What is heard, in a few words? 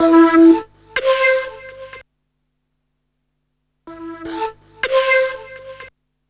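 SYNTH, TIMBA, Hip-Hop & R&B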